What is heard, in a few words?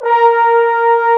BONES, Sweet Brass; E-MU Producer Series Vol. 2 – More Studio Essentials; Composer